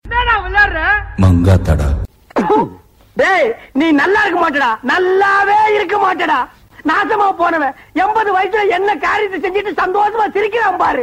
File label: Terror Tone; Mangatha